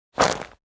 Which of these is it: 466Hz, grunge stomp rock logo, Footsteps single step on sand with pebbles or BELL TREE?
Footsteps single step on sand with pebbles